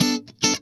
FUNK N CHUNK, FUNK GUITAR; Greytsounds

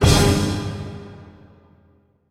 orchestral hit; Orchestral Loops

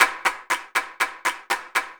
Beat, Drums, Eclectic Electronica

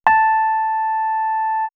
Rhodes; X-Static Goldmine; backtothe70's

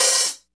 hi-hat real; Hi-Hat menus

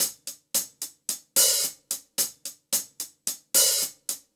Hi-Hat Groove; Sonic Foundry (Sony Creative Software); Vital Drums

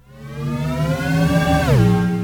WAVEDRUM; Back In Time Records; Big Bang

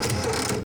SERVO; Total Control - Action Cutz

